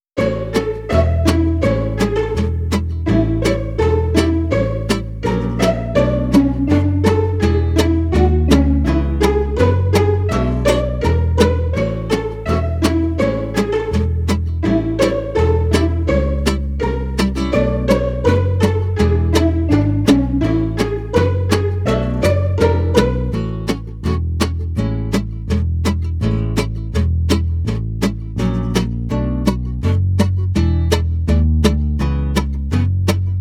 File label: cheerful entertainment pizz no bells; by burak sound bank; cheerful vol 16